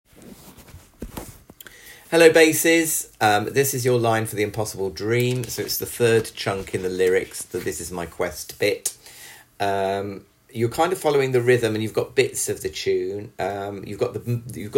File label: BASS